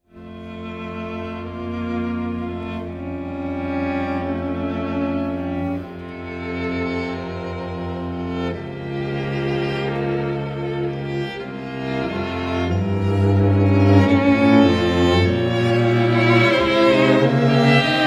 string quartet in c; Antonin Dvorak; op 61

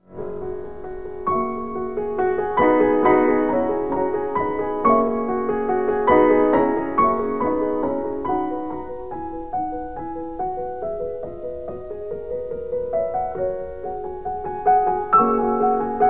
sonata in E minor for piano; sonata no 27; op 90

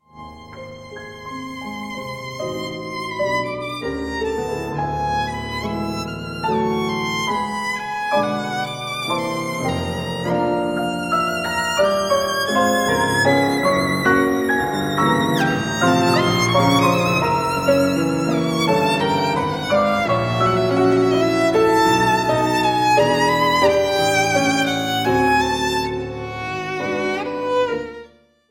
romance in d major for violin and piano; op 23

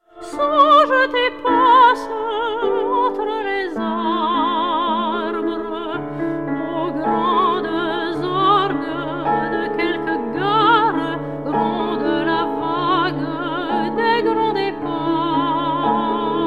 rêves for voice and piano; maurice ravel

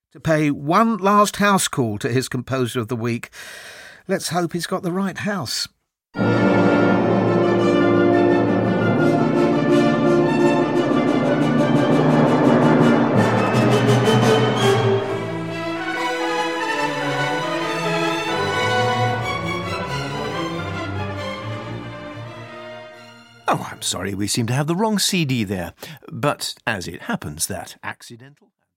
symphony no 3 for soprano and orchestra

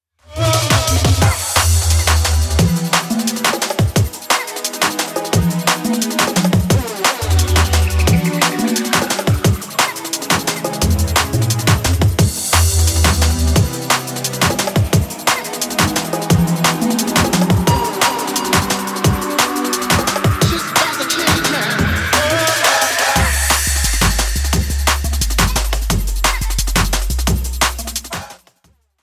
decimal bass dub

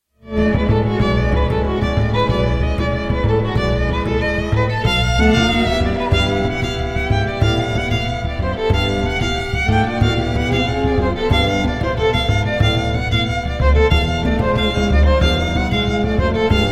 string quintet; emerson string quartet; edgar meyer; op 121

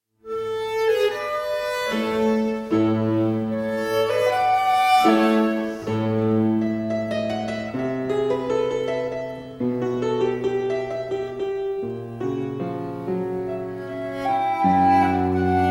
quartet for piano flute viola and cello in G; carl philippe manuel bach

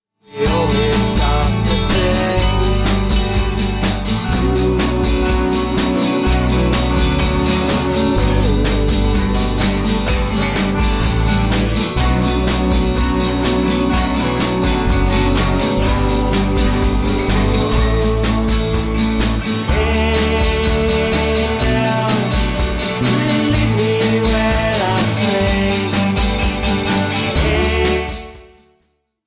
bells

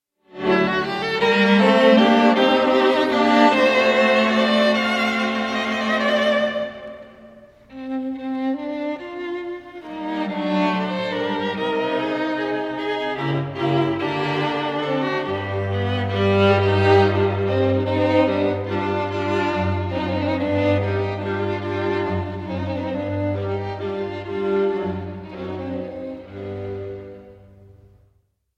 string quartet in g minor op 20 no 3

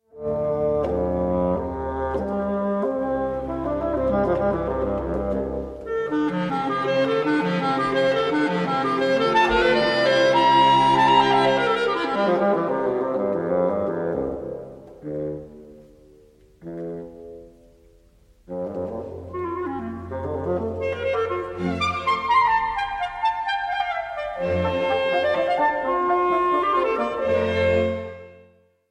duett concertino for clarinet bassoon and strings; richard strauss

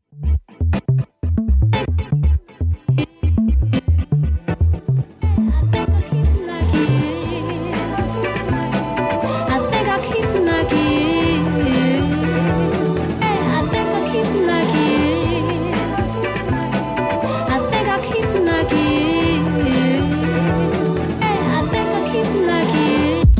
percussion

